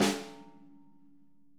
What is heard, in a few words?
snare, Interactive Drum Kits; Sonic Reality